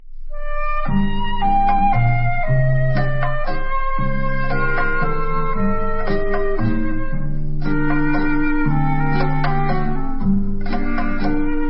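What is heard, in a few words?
instrumental, Israeli Dances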